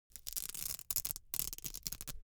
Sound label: foley velcro open cap slow